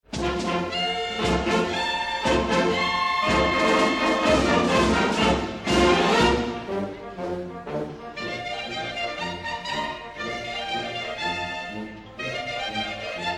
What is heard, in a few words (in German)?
klarinettenscherze